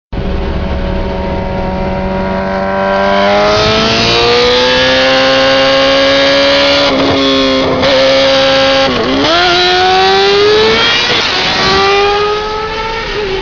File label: 600 Gsxr Bike Sound